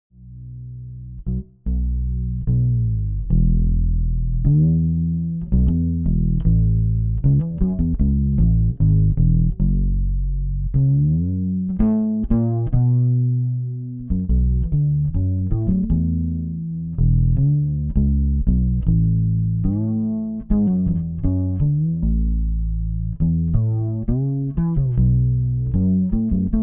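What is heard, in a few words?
Fretless Chorus Reverb Ballade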